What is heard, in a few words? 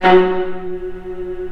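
Viola; E-Mu Proteus Pack; Digital Sound Factory Virtuoso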